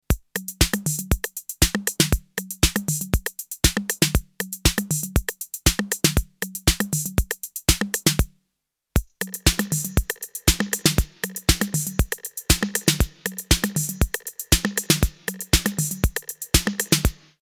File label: 808 Loop